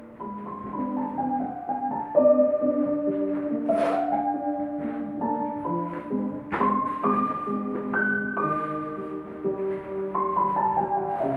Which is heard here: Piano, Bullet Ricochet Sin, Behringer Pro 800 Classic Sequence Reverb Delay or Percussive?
Piano